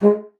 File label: bassoon G#3; Xsample VOL-6; C BASSOON